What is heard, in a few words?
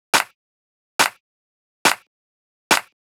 drum Loop 140BPM Clap